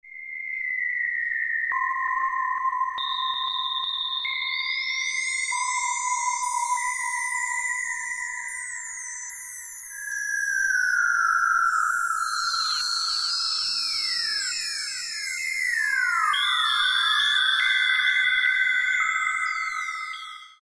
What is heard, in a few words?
50s SciFi